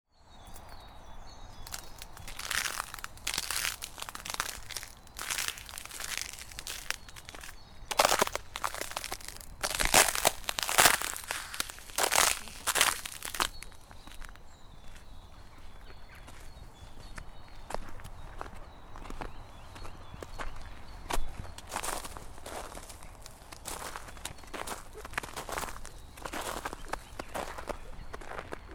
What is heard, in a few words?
frozen puddles; Frozen puddles, Boelaertpark, Antwerp, Belgium in early January 2024; Walking with corduroy trousers into frozen puddles.; Joyce de Badts; Field Recordings